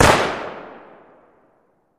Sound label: Gun Shot Sngl Ext